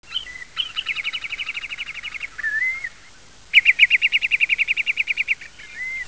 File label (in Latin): Tringa stagnatilis; Поручейник